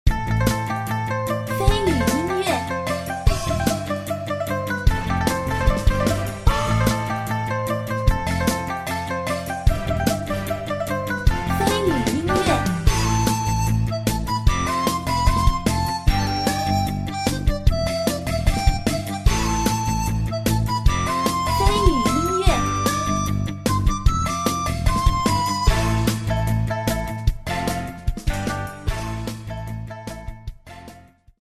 战斗 激烈 欢快

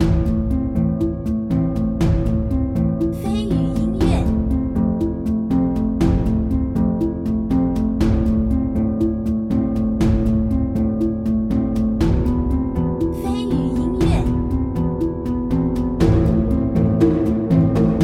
战斗音乐

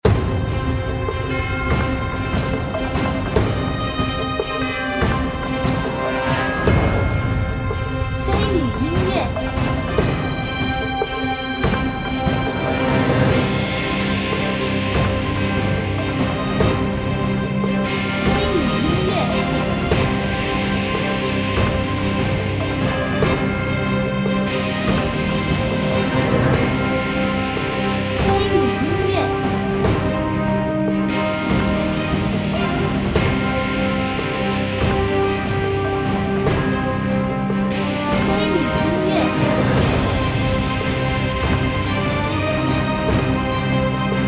诡异-惊悚-悬疑-压抑

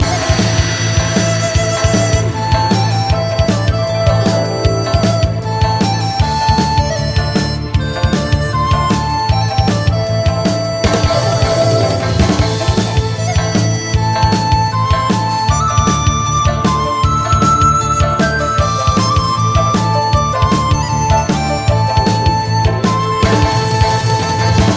激烈 战斗 励志

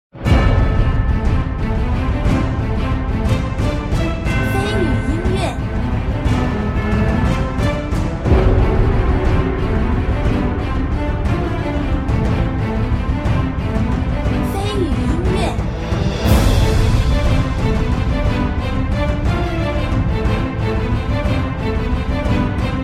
战斗音乐

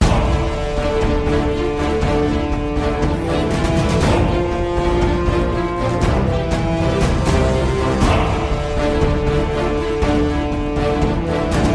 登陆

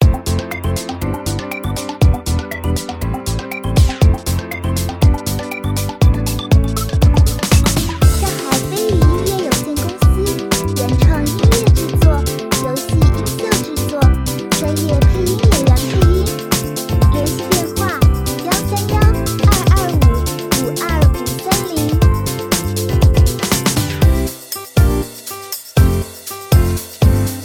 电子Q版轻快登录主界面; 精分鸟

